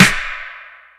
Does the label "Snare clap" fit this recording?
Yes